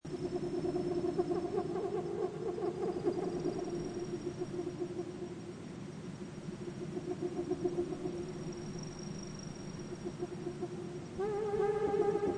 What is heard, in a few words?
Jurassic Atmos Loop Long, Swamp: Jurassic fantasy swamp ambience. Seamlessly looping sound; Long version; Lynne Music